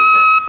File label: beep tone